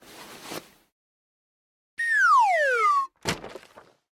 market crash; toontown-ceiling

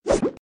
move; toontown just works

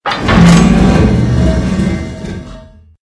arms retracting; CHQ FACT; Poodletooth-iLand

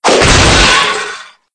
cog fall apart; Poodletooth iLand

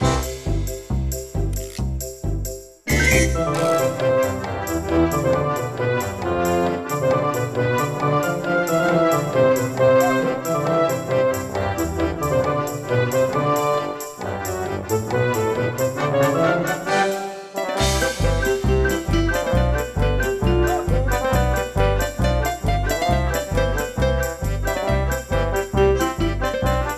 party polka dance

